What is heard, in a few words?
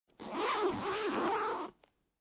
Zipper Zipping Open CD Wallet, Foley, Zipper, Zipping Open Large CD Wallet; Zippers